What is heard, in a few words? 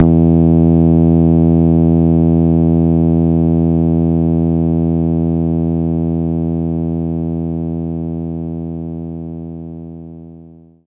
bass guitar E2